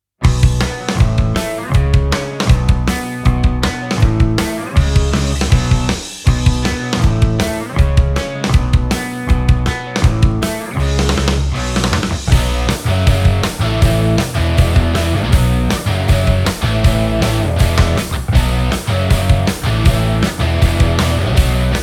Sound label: Punk, pickups